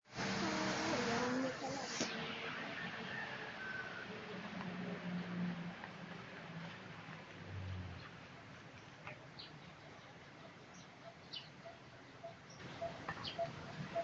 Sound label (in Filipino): pokpok